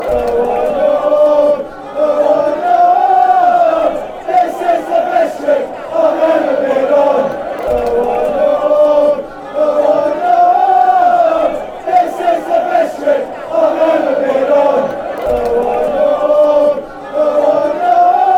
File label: dont wanna go home, fanchants